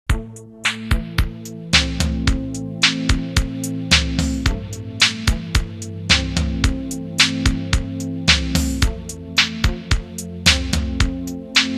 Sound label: Lost Sad Jam